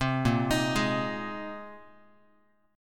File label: A sharp 7th Suspended 2nd Suspended 4th, down, Guitar; arpeggiated; 3,6,6,6,x,x; chord; JGuitar